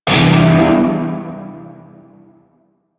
Shawzin Phrygian Chord A; Music; Warframe; Narmer Shawzin

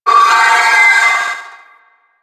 Trooper Attack Long, Vocals; Warframe; DE; Sentients

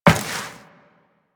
Step Metal Perforated, Footsteps Walk; Warframe; Tenno

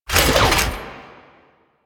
Body Roll

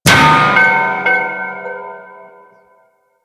Lift Bell; Duviri

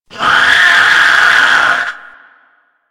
Zombie Attack, Vocals; Mariner Zombie

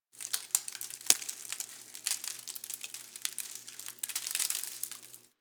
Derelict Leave Drop, Ambience, Interior; Warframe; Orokin Derelict

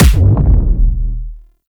Infested Mercy Shotgun Punch, Weapons

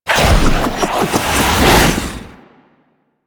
Ice Charge Attack; Warframe; Railjack; Grn Admirals

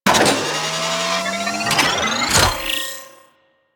Crp Ship Rolo Dex Open B; Ambience; Props; Warframe; Corpus Ship Remaster

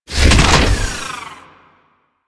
Anti Aircraft Gun Empty Clip; DE; Warframe; Weapons; Archwing

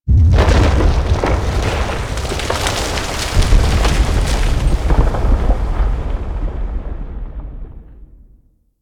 Mountain Pass Mini Avalanche; Mirror

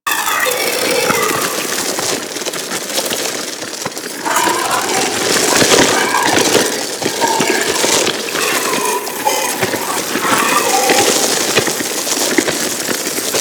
Regrowth Chatter Loop; Props; Warframe; Ambience; Sentient Devourer

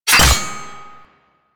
Crafting Equip, UI; Warframe; Cetus

